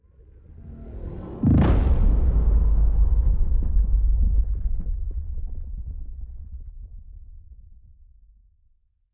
ship colossus move; ships; Stellaris; shroud

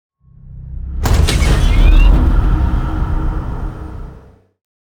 ringworld build start; Stellaris; utopia

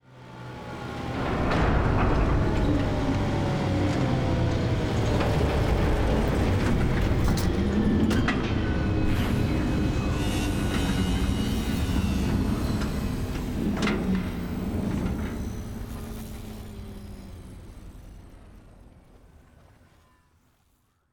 ship colossus closing; ships; Stellaris; toxoids

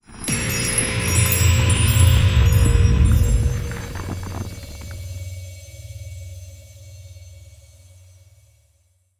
relic activation miniature galaxy; ancient relics

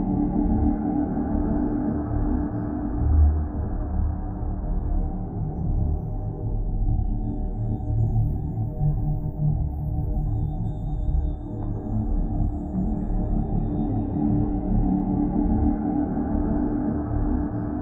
ship starbase idle; Stellaris; machineage

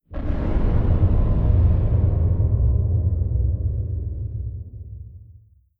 distance extra dimensional ship death; ambient; Stellaris

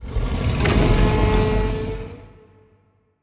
kaiju adult rage roar; biogenesis

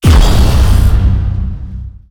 tachyon lance hit; weapons; Stellaris

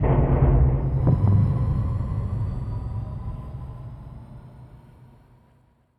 ship move; azaryn; Stellaris; paragon; ships

